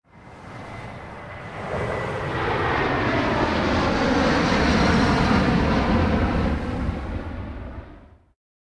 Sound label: Aircraft Pass; 100 sound effects